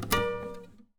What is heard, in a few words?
Classical Guitar Double Note Plink